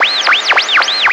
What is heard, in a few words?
PHONE FREQS; Spectrasonic Distorted Reality 1; BIZZAROS